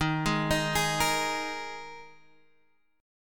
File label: D sharp Suspended 4th D sharp, Guitar Half Step Down; chord